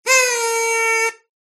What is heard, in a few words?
Party Horn